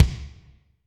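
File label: Kick Tite Hall, Alesis D4; Kik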